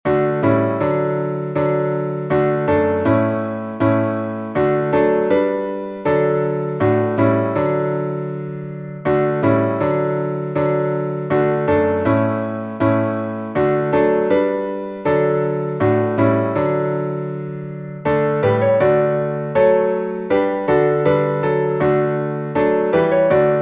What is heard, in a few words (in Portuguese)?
salmo instrumental